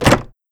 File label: Wooden Door; Angry Goy II; SoundEffects